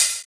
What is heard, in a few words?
Open Hhat, HiHat; Equipped Music; Loungin House